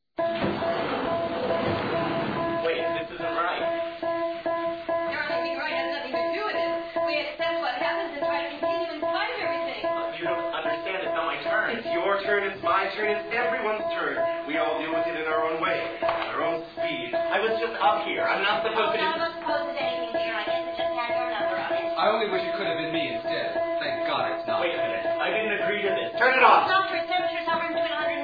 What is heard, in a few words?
lofi hospital